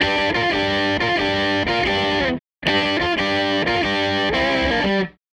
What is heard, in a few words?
Les Guitar Loop